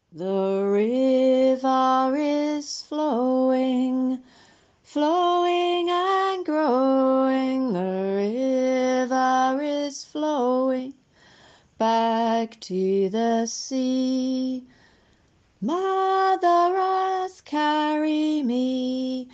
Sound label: SONG, mantra yoga; ENGLISH